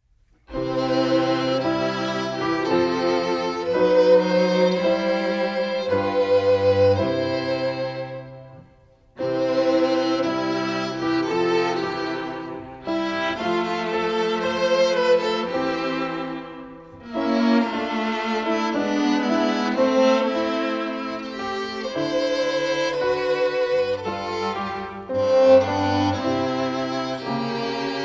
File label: music only; the eleventh article of faith